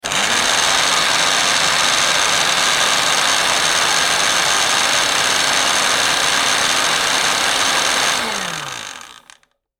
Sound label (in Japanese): 料理用ミキサー